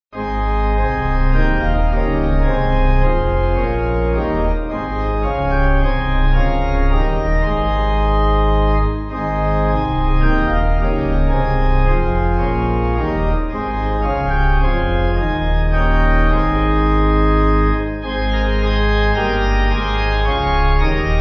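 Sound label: Pipe, hymn backing; O Day Of Rest; Ellacombe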